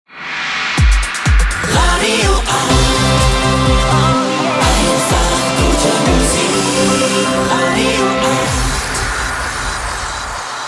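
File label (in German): Transition Fast Dance to soft aktuell; RADIO 8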